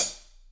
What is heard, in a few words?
HH hh; Best Service Gigapack 2